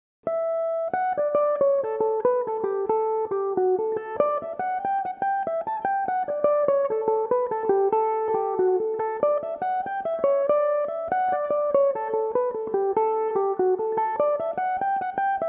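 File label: D major, Irish mandolin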